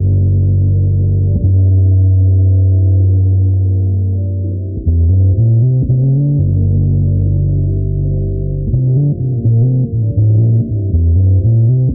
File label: bass, Sad; Loops